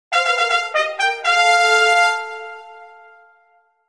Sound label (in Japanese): レベルアップ; ドラゴンクエスト; dragon quest